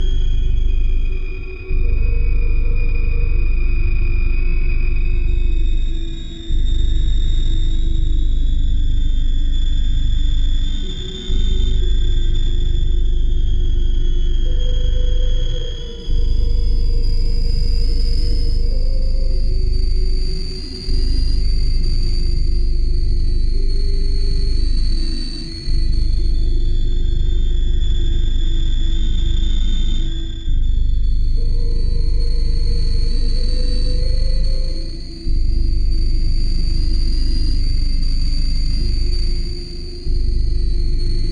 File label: horror